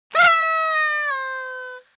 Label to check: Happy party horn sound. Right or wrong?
Right